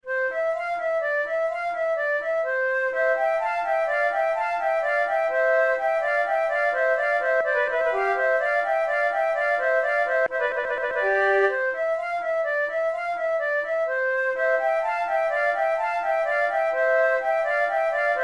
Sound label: fanfare, flute; naudot